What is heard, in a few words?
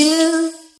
ewwww; Truth-Hz - 1-Hit KO Sample Pack